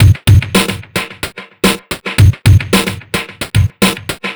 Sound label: Noise Beat; Drums & Drones